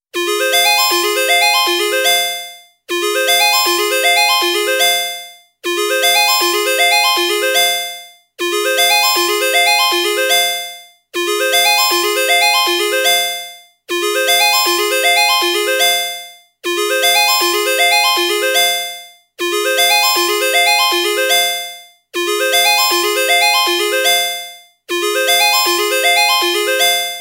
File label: Arpeggio ring